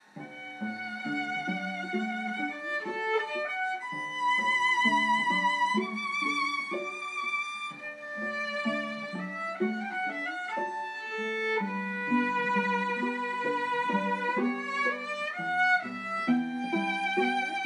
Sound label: Trio; Meditation